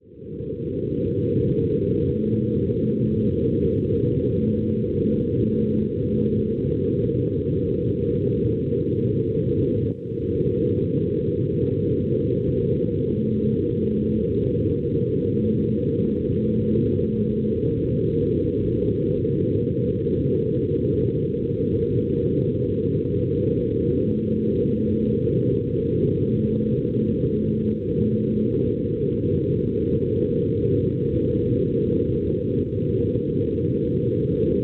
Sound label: NDB 337 kHz; MY; Myggenaes; Isole Faroe; Schwarzsee